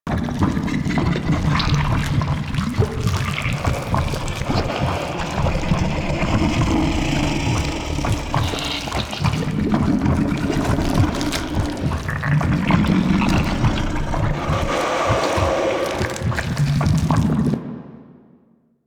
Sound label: Infested Drop Pod Move Loop; Warframe